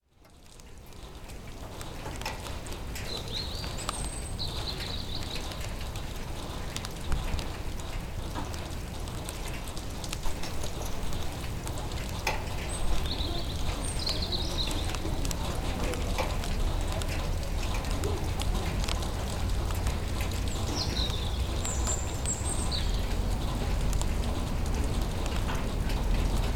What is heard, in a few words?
night bird, sergiyev posad